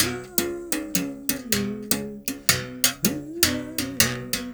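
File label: BS BERIM GRV, BERIMBAU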